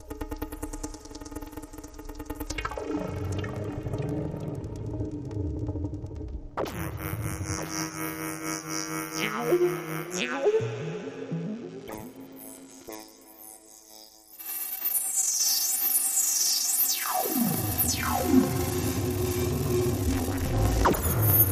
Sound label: A Long Way Out There Musical Pulsers